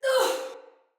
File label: Death Finisher Soldier Female; Battle; Barks; Dialog; Sinecure Soldier Female B